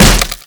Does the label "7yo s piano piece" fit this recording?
No